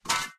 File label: fallen hurt, monsters